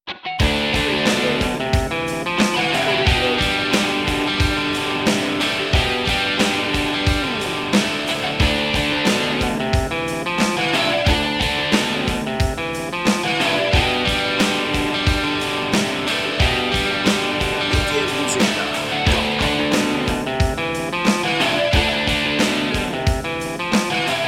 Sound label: grunge